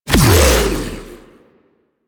Regurgitate Impact; DE; Warframe; Warframes; Grendel

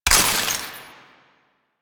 Ammo Pickup Shock; DE; Warframe; Warframes; Frumentarius; AmmoAbility

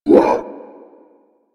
Infested Melee Grunt; Warframe

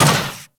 Moa Combined Step Default, Footsteps; Enemies; Warframe

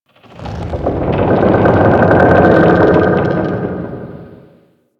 Settlement Low Rock Creak; Ambience; Warframe; Grineer Settlement

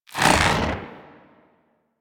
Head Pull; Warframe; Teralyst; Ropalolyst; Flight

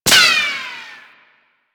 Sniper Ricochet; DE; Warframe; Warframes; Frumentarius; ExaltedSniper

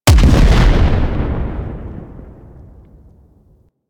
Bomber Bomb Explosion; Warframe; Eidolon; Grn Bomber

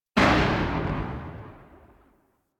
Arch Burst Gun Tail, Weapons; DE; Warframe; Archwing

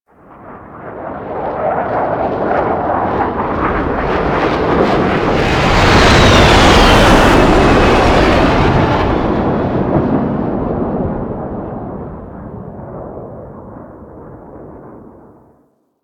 Paratrooper Plane Fly Over; Enemies; Soldiers; Warframe; Lasrian

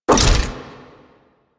Orb Shot End; Duviri Medusa Puzzle

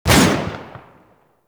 Hand Shotgun Alt Shot

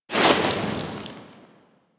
Sword Elemental Fire Whoosh; Enemies; Weapons; Warframe; Teshin

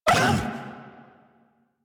Feral Vocal Pain; Warframe; Kubrow

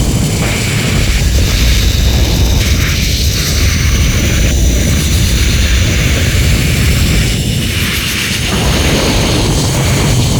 Branding Device Mind Ouch Loop; Warframe; Tutorial

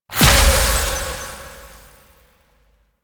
Bleeding Shards Impact; Warframe; Citrine

